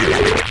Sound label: pain; QUAKE2